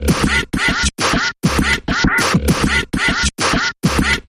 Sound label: Mechanical Percussion Variation Short Version